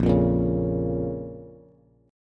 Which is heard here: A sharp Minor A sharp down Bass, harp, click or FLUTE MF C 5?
A sharp Minor A sharp down Bass